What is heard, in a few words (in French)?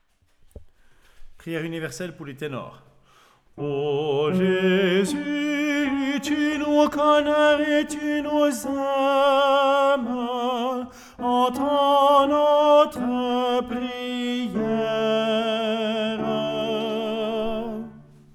Chants Priere universelle T